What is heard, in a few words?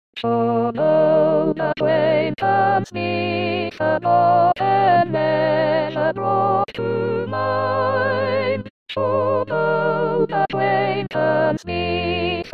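soprano; Auld Lang Syne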